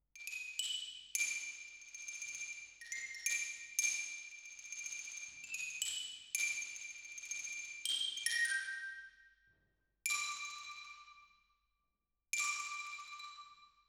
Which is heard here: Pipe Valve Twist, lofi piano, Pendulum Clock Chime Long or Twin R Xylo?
Twin R Xylo